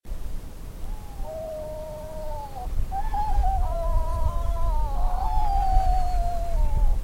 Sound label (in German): madeirasturmvogel